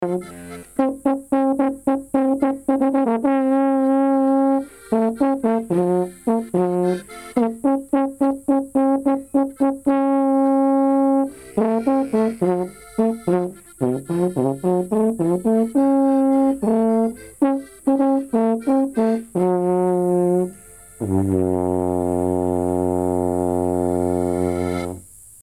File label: alphorn